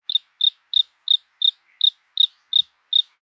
Amb Animals; Red Orchestra Ostfront 41-45

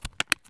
plastic open; Escape from Tarkov

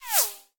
bullet passby; War Thunder

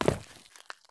landing asphalt; Escape from Tarkov

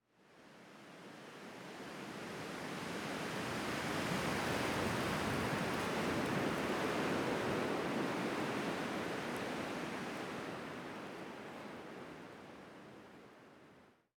ambient; World of Tanks

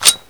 Inf Weapons Foley 3rd; Red Orchestra 41-45

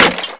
bullet glass; weapons; Modern Warfare

